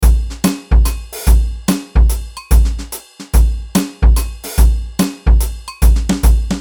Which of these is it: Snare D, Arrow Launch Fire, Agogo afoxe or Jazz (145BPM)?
Jazz (145BPM)